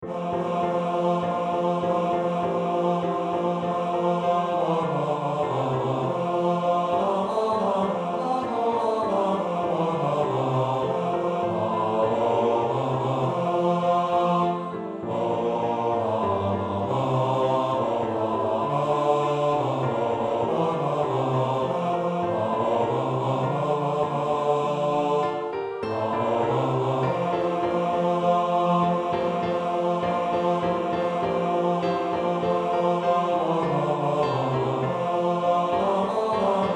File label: Bass; Infant Holy Infant Lowly